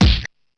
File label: BD lompo, DRUMS; Polyend; Legowelt